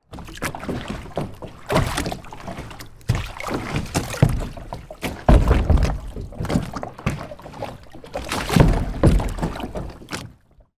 transport boat kayak float next to and into wood jetty